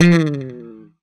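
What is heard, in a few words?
slap, bass; Xsample VOL-1; SLAP+THUMB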